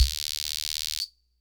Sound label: Guiro Long, sr-95; classic machines